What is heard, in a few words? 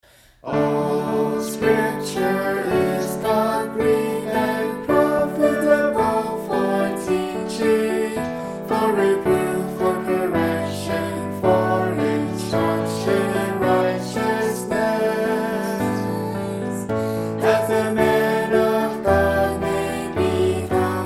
sing; All Scripture is God-breathed; Hymns; Children